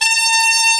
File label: SAX A3, BRASS; AKAI S-Series CD-ROM Sound Library VOL-2